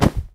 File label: puff; cdogs; techdemo; funwithguns